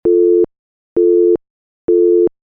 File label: call Busy